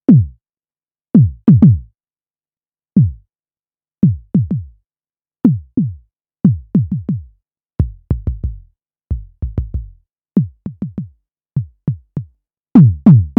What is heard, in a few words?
drums